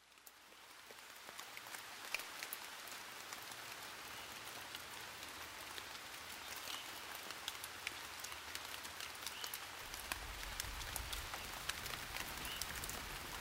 Thunder Intro

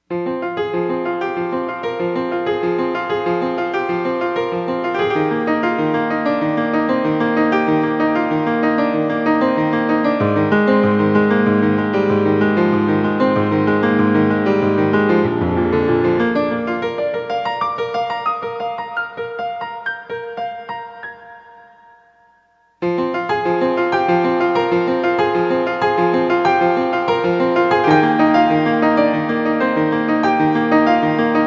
Prelude No. 24 in C Minor, Solo Piano

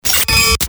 Triangle Break; Best Of N2O Library; Breakers & Transitioners

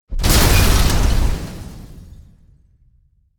Exploding Debris; DJ sounds

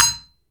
IRONWARE, IRON WARE HIT; 1000fx

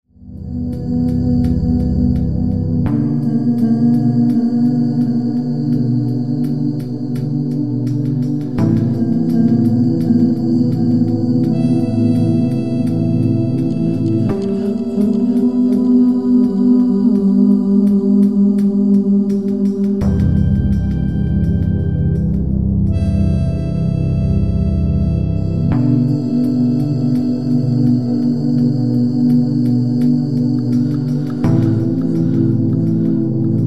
chord

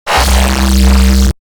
Zap Squeeze; DJ sounds

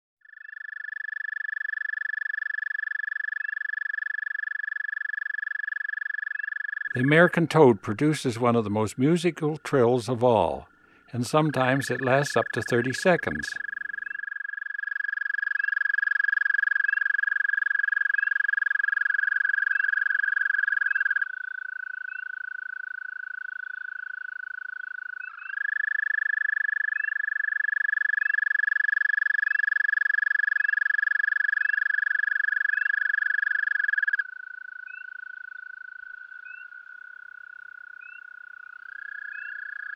American Toad; Eastern North America; Dan Gibson; Wildlife Identification By Sound

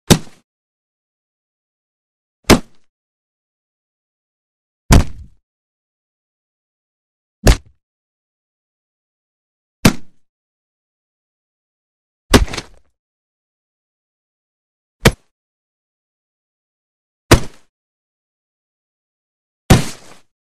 Heavy Body Punches Punch With Thud; Hollywood Edge Foley Sound Library

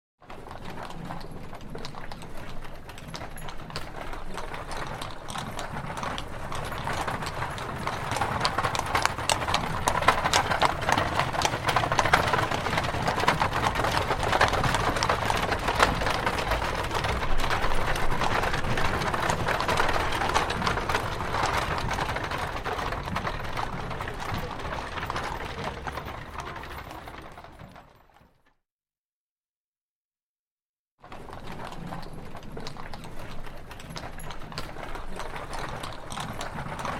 Horse Drawn Carriage Start; Horses Carriages and Traps; Hollywood Edge Historical Series

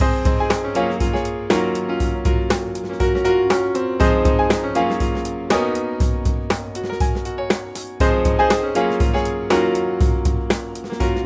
Drive time, music beds, affects and jingles

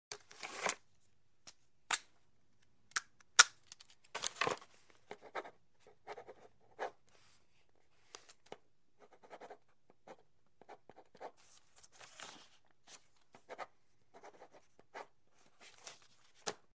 Writing With Pen; Hollywood Edge Foley Sound Library

